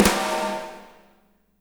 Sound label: SNARES; Spectrasonics; Burning Grooves; BG SNARES 4